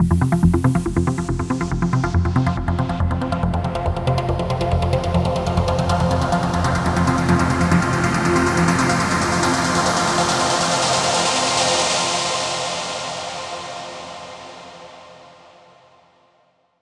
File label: Driver 8 Bars F, 140 BPM Melody Fills; Vengeance Samples